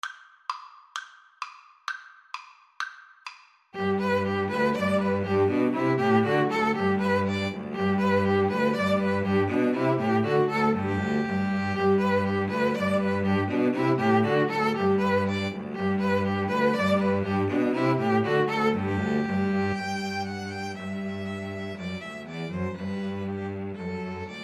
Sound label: ecossaise, String trio